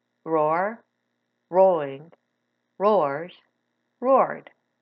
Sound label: roar